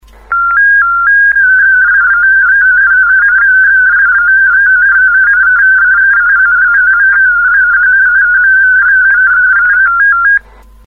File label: Olivia250-8, digimodes